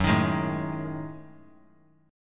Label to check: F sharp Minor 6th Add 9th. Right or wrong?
Right